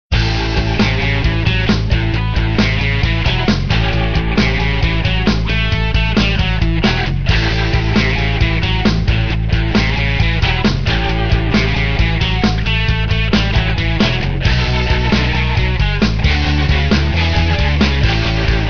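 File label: Instrumental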